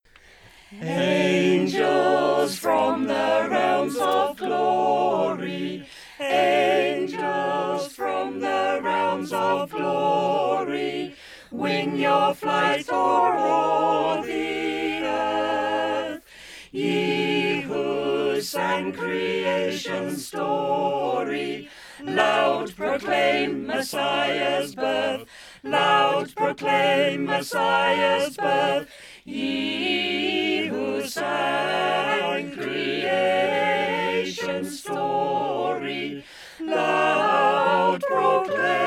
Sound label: CarolChoir Angels